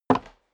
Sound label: step dress boots wood creak single left; schritte; Footsteps; menschen